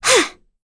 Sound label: Vox Landing; Pansirone; kingsraid